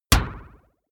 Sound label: sci-fi metal hit wobble; whooshes rises; hits impacts